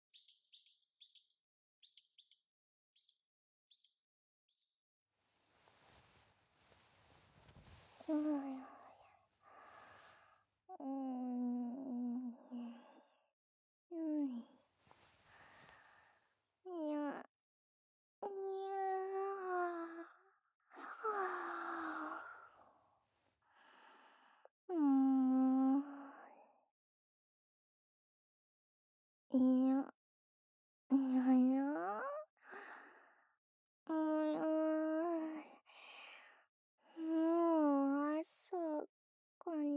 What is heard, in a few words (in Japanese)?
囁き 耳舐め; 目覚ましにゃ～♪; SE有り